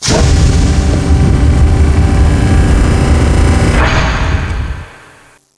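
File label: door close; sven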